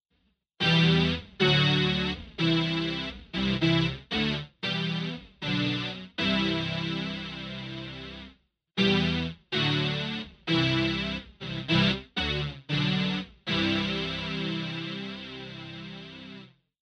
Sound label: SYNTH BRASS